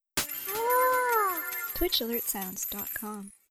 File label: Anime Donation